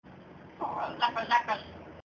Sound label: parrot talking; animals